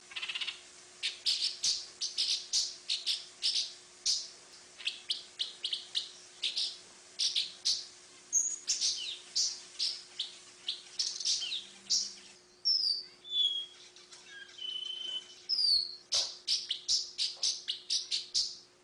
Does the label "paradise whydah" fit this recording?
Yes